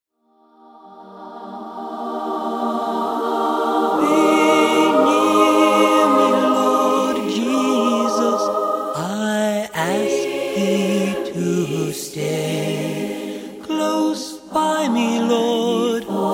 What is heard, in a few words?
Away In A Manger A cappella